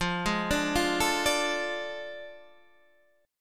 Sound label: A Major 7th Suspended 4th Sharp 5th F